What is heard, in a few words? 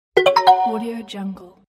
game notification; debol757